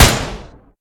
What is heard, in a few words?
Gunshot